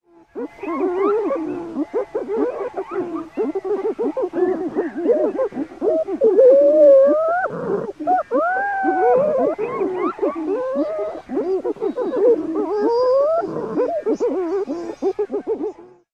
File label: Colony calls, Chile